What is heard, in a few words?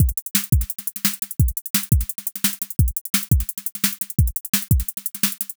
fast liquid dnb break 172bpm 4 bars